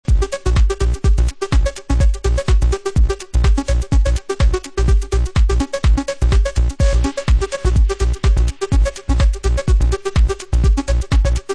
perc remix

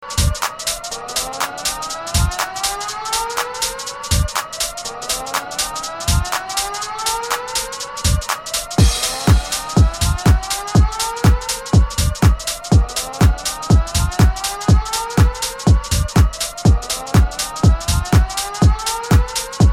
Siren Chords; Drumpoet Community

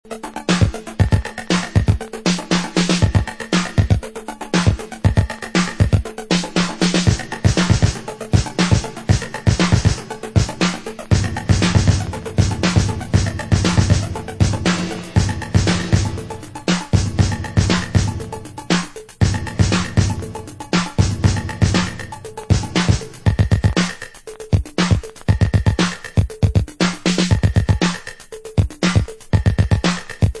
Instrumental

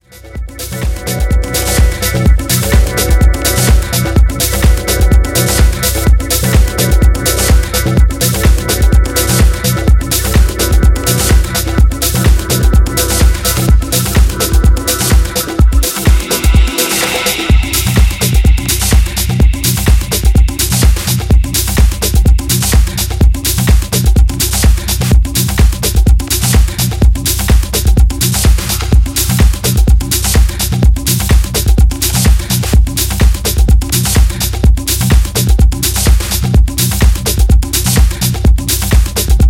glad chord

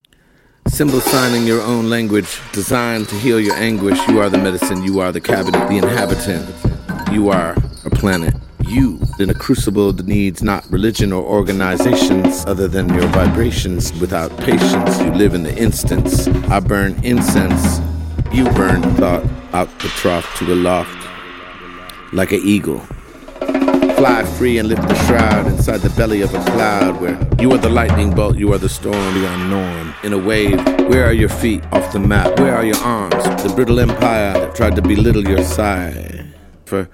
Drum Poem

